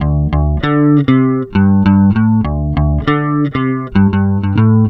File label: SLIDE D, Bass Grooves; USB Soundscan vol.30; AKAI